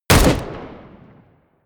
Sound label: rifle close shot; comedy-cartoon; pistolen-gewehre